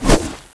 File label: slash, Hacha; JailBreak